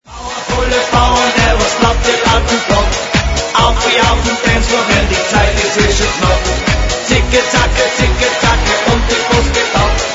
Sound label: Alpen Polka